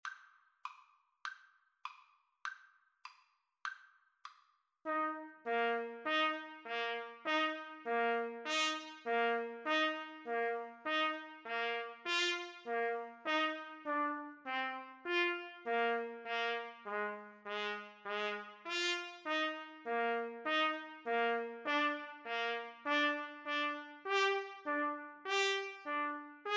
trumpet duet

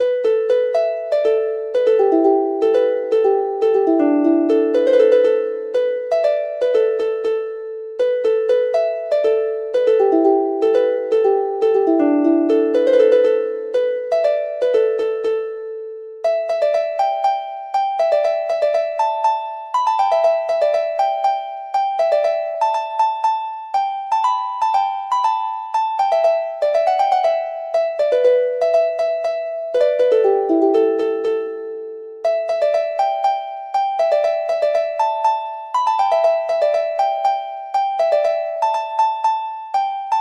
harp

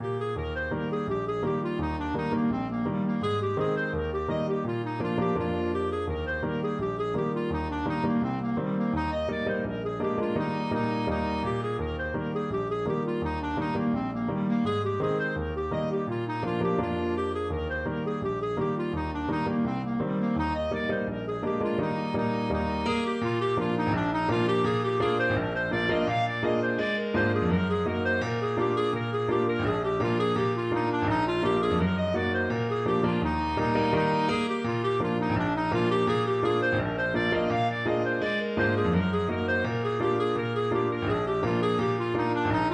autumn woods clarinet

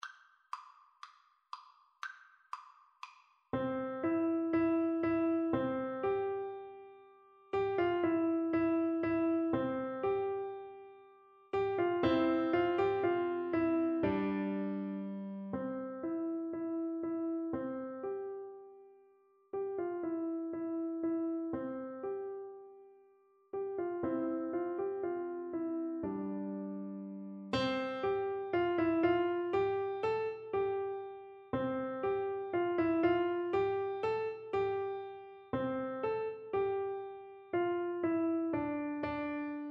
piano duet

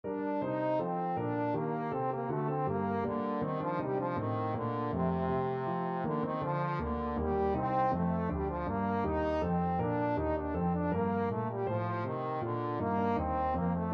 bourree, trombone

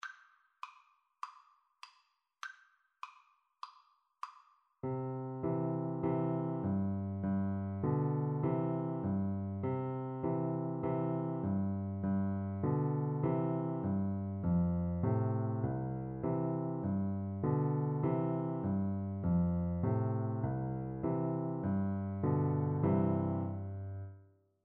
piano duet

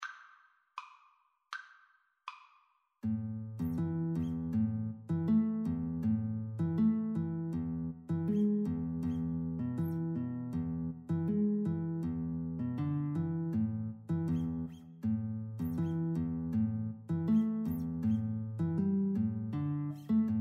guitar cello, Guitar-Cello Duet

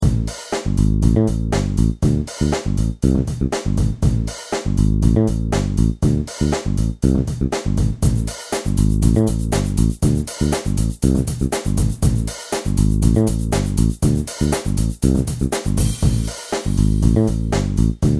bass guitar

